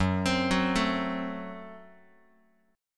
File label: F sharp Diminished F sharp, Guitar; arpeggiated; x,2,3,4,1,x; 4A,4E,5A,5D,5G#,6C#